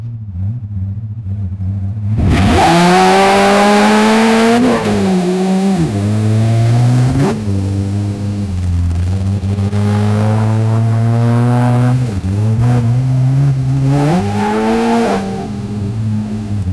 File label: ultra realistic formula 1, race car